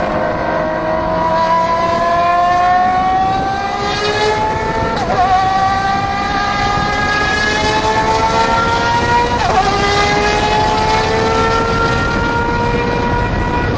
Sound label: F50 GT1